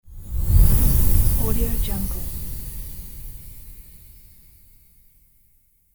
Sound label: magic whoosh